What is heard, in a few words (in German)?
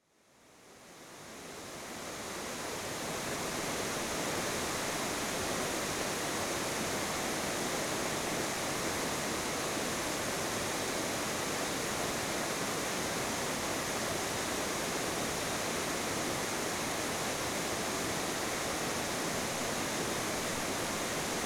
Wasserfall